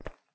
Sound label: footstep